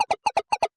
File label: downstairs